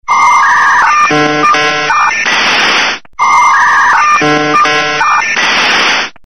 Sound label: Modem